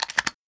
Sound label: w73 insert shell, winchester73